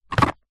office business cards in card box tip movement short